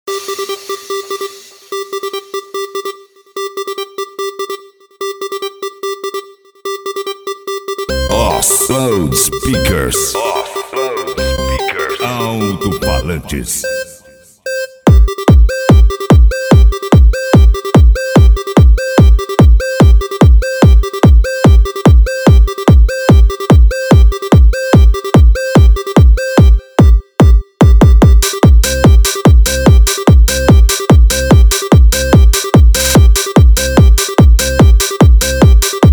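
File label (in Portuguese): alto falantes loudspeakers 5250 w agressive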